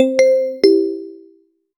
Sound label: generate a short soft and, chime